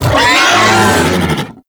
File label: Infested Juggernaut Vocal Attack; Warframe